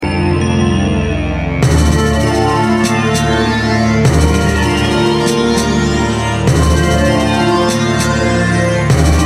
snares stereo

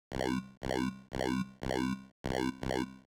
reso 303 sound bitcrusher

